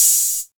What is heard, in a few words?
open high hat sample b key, Royality free open high-hat sound tuned to the B note